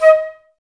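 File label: flute, Woodwinds; Sample CDs; Miroslav Vitous; Woodwind Brass Ensemble; Banks W&B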